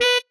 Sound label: marimba bat; ゆめ2っき